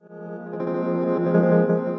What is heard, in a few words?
Guitar & Plucked; Materiale di sogno